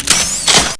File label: load, flak; zs flak